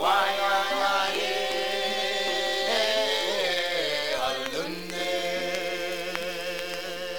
Vocal

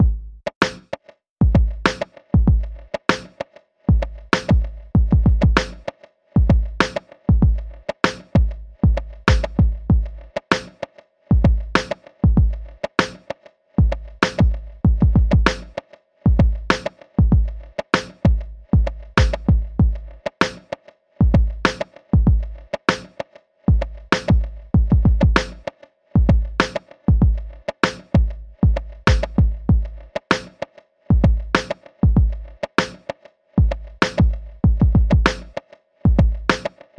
Drum Loop 97bpm

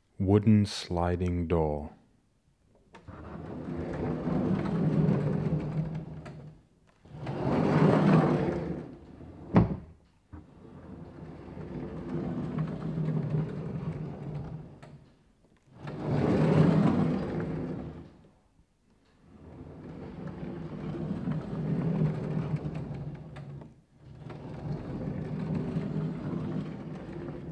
Wooden Sliding Door

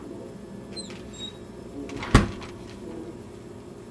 Opens Fridge

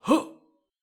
Voc Male Jump

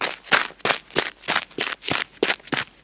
Running Gravel Or Dry Leaves Loop

